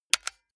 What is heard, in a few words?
console controller xbox rt press fast